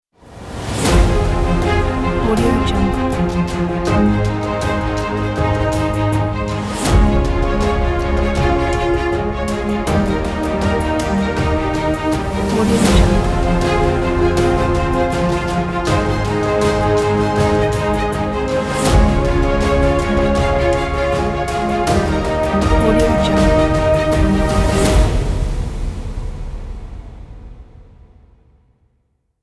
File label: cinematic epic ident